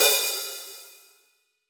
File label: hi hat 14 inch open; soundscrate